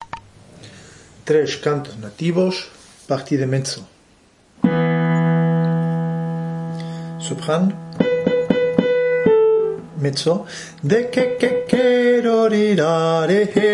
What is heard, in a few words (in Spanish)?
Canto Indios Krao M